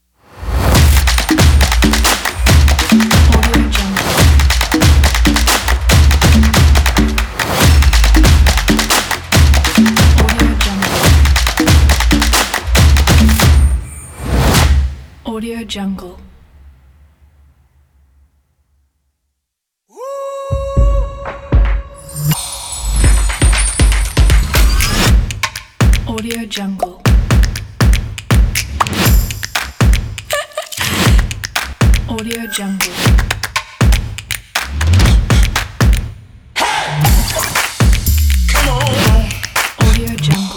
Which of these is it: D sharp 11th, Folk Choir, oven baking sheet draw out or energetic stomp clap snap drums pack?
energetic stomp clap snap drums pack